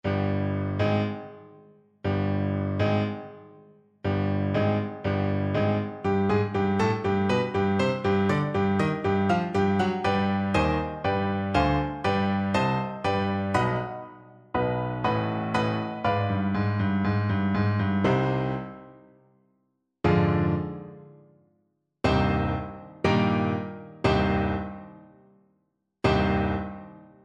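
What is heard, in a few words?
dvorak new, alto saxophone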